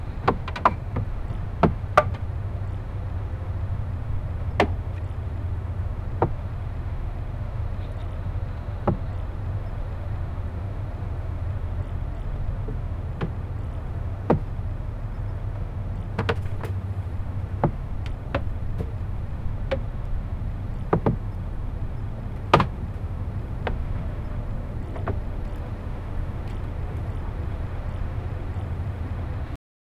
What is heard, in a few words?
background sounds on a wooden ship